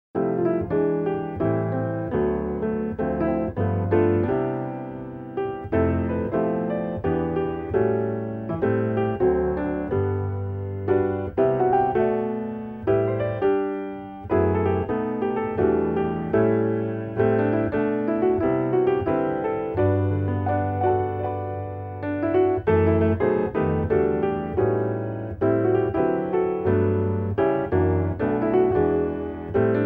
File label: Bubbly Swing